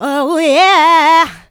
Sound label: Vocals, Adlibs; Houseworx